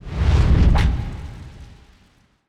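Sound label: free spin activated; netent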